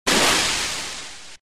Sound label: Knight Skill